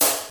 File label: rap hi hat e key, Royality free closed high hat sound tuned to the E note; 90s